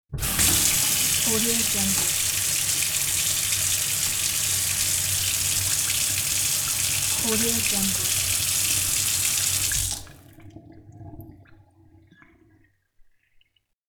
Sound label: water tap